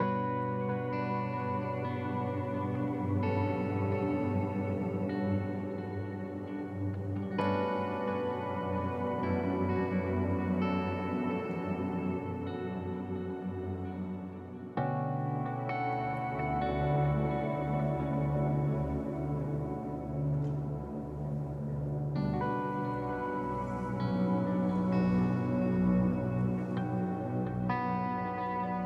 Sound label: STRINGS LOW; Kontakt third party 5 demo song opacity